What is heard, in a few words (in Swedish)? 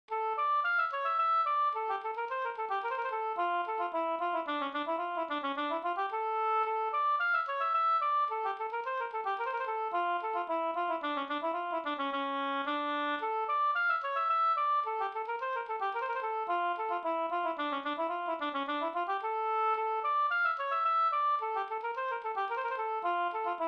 slangpolska